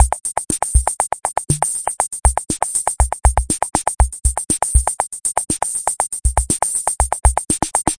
120 electro percusso tappums, drum loops and breaks